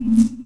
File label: zap